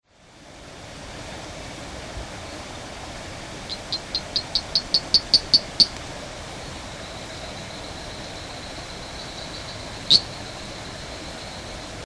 Boophis haematopus

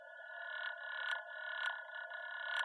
Xenopus wittei

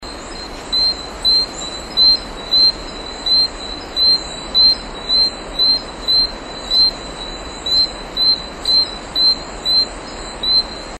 Gephyromantis eiselti

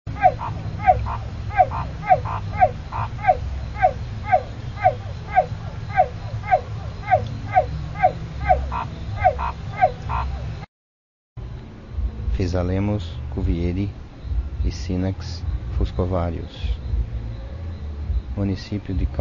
Physalaemus cuvieri

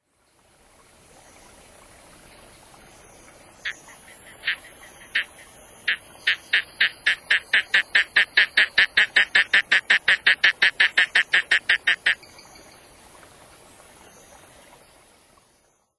Mantidactylus opiparis

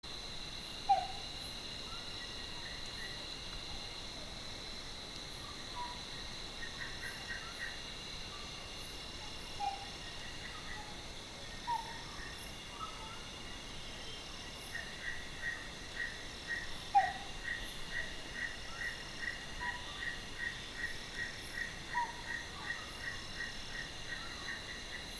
Metaphrynella pollicaris

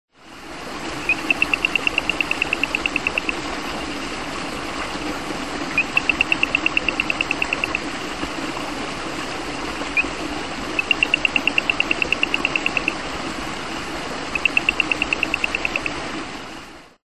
Spinomantis guibei

